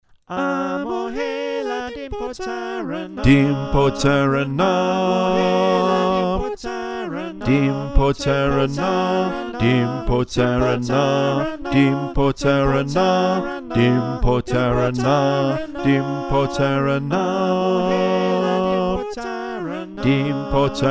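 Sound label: Bass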